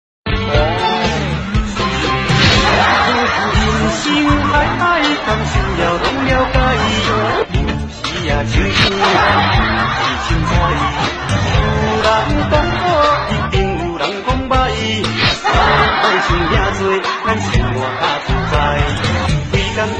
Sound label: laugh; breadradio